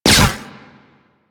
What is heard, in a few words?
Flak Cannon Alt Fire Pass By; Warframe; UT Flak Cannon